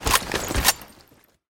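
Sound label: rifle reloading during hunt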